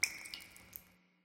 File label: waterdrip single; ambient